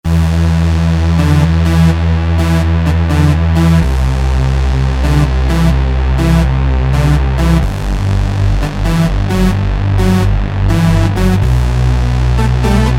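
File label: Slobbry Bass; Roland SRX-07 Ultimate Keys; SlobbryBsSRX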